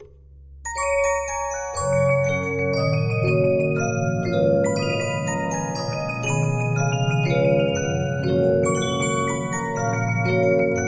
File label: Regina, 15inch disc musicbox